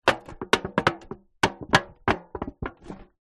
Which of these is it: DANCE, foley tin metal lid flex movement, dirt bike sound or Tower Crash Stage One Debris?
foley tin metal lid flex movement